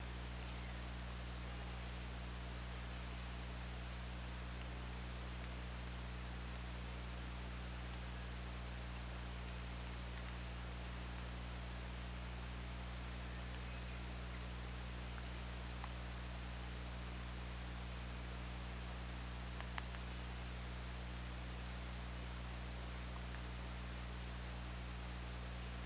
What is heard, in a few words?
OrcaLab